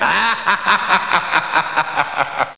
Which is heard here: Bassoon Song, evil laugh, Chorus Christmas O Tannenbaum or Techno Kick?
evil laugh